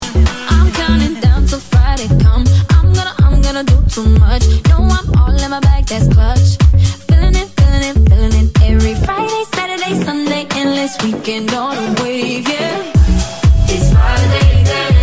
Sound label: bass boosted; made with Voicemod